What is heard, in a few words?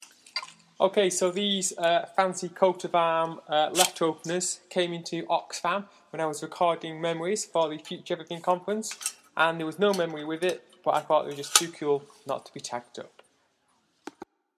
paper opener